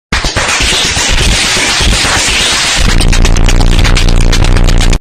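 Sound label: fast ahhhhh clapping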